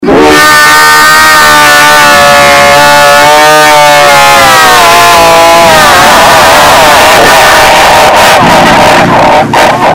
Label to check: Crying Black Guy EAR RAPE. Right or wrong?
Right